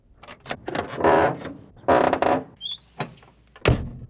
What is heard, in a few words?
Vehicle Door Old Open Close; Vehicles; Doors & Keys